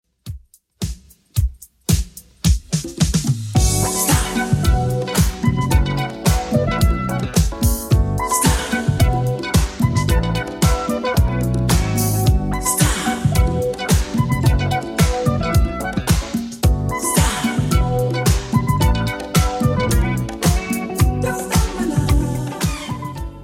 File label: 80s Redrum 110 bpm CLEAN